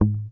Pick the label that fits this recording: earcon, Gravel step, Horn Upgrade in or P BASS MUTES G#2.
P BASS MUTES G#2